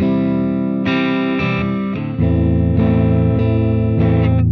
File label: GTR